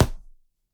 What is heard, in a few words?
Punching Percussion, Stick Hit, raw; 99Sounds